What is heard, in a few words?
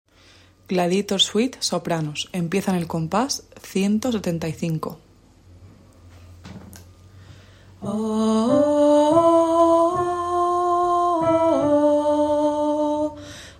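soprano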